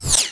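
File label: bullet left to right, near miss; hl2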